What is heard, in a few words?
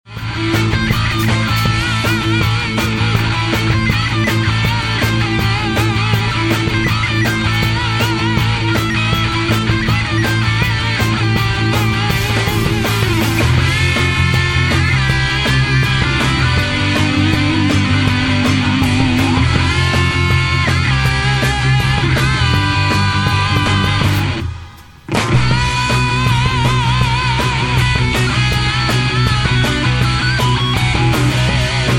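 rock song, guitar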